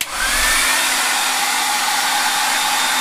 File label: hairdryer high